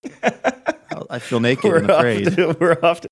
laughing feel naked afraid

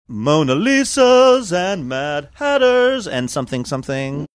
singing

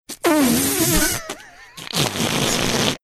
making mouth farting sounds

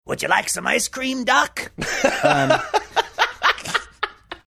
saying would ya like some ice cream laughing

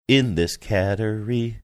singing in this cattery

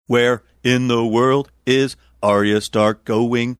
singing where in world is going; arya stark

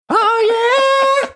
singing ah yeah

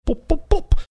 boop boop boop

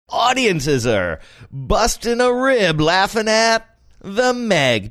audiences are busting rib laughing

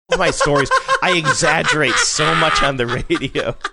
having good laugh

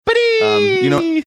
making fanfare sound

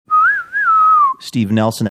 whistling saying

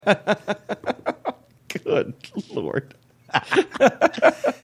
laughing good lord

